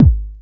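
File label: bass drum; 300 Drum Machines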